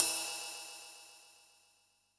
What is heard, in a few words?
RIDE, Yamaha DD-65 CYMB